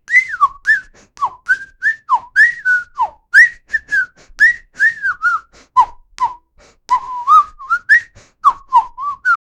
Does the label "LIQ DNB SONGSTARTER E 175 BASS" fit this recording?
No